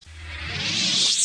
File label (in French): sabre laser, Extinctions Sabres laser